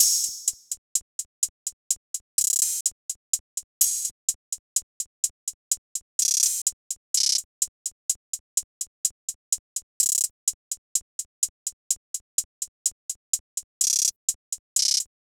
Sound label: Cold Feet Hihat Loop - 126 BPM; Cymatics; Fiji Hihat Loops & Percussion